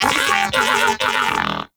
Moa Pet Tenacious Enemy Spotted; Pets; Warframe

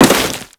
Crewman Step Dirt, footsteps; Warframe; Corpus

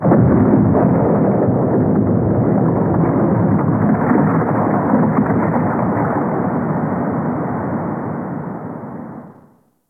Ice Distant Ice Break Large, Ambience; Warframe; Corpus Ice Planet

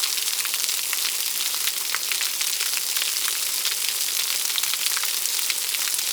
waterfall small loop; Ambience; Props; Warframe; TheNewWar; Zariman

